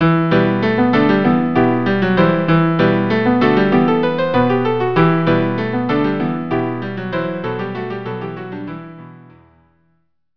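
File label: freylekh violoncello piano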